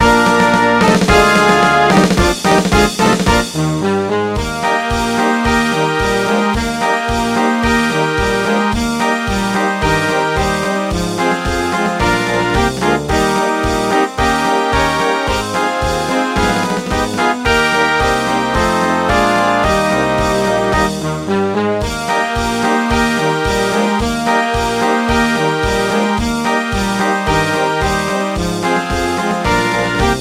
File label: happy marching band